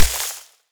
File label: projectile dirt hit; soil